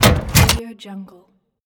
a safe door open

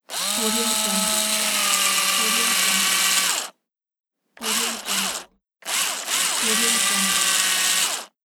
electric screwdriver; davide martini

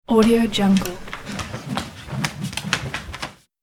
bow staff swishes; sound ideas

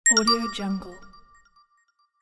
interface open

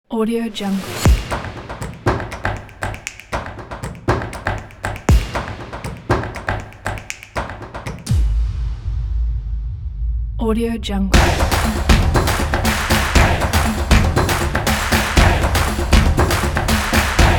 this is clapping

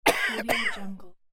sick coughing

